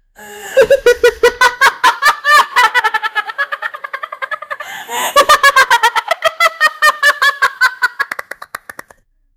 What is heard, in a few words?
clown laughing